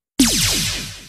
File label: Lazer